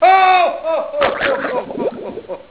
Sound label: DROWN